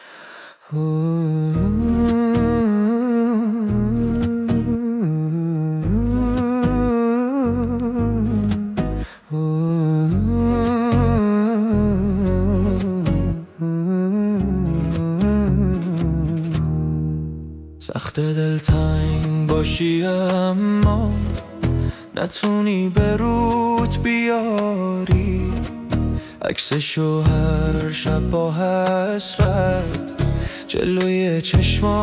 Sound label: music sad; آهنگ غمگین گریه آور مخصوص اینستا